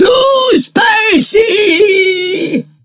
death; Awesomenauts; Commander Rocket

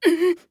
Mage Wandering Alchemist Grunt Fast; Realm Royale

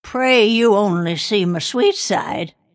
cast pain; Dota 2; snapfire

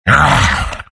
animal sound; beastmaster

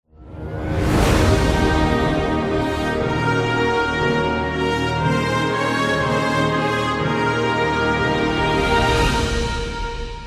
radiant win, music

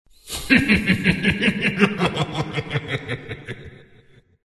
laugh, zh

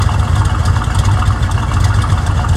Timbersaw idle loop

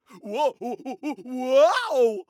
Falling; Khan

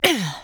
Grunt Attack; Tyra

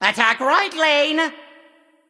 Attack; Kuku Kukulkan

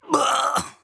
Death; paladins; Demon

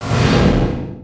projectile launch; dota2; Vengeful Spirit

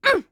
Grunt Fast; Realm Royale; Mage; Starburst Sorceress

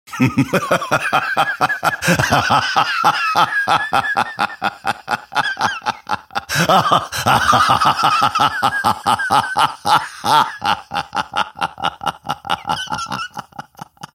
grimstroke laugh

